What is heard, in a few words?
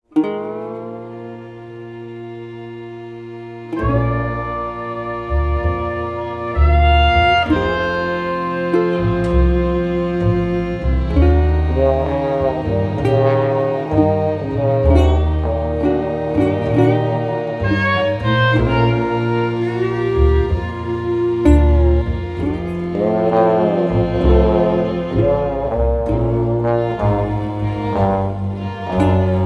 Bordello Swing